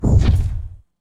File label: destroy friggate; fertig-classic-games; Puzzle; vega